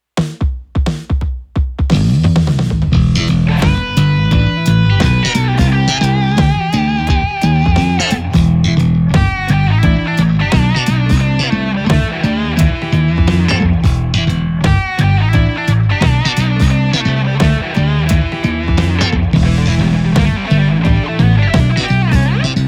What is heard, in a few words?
Slap; Alusonic Django Standard 4 Matte Black